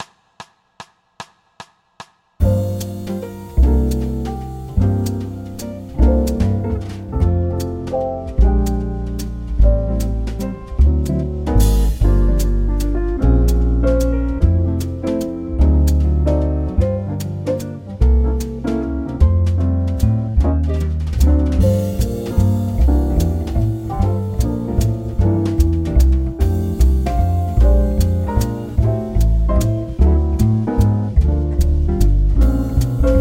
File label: horns play along, waltz, jazz